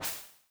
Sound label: miss; Incantation